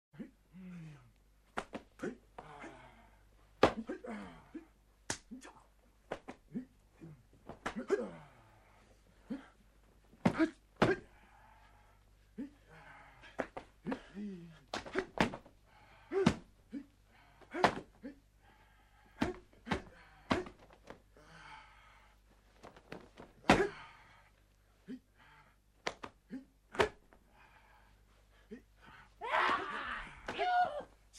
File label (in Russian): sparringovyj match